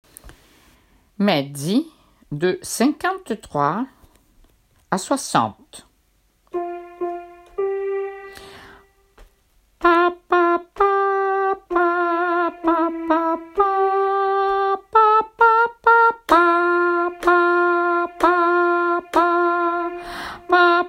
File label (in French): mezzi lullaby